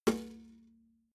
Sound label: metal bucket hit bottom soft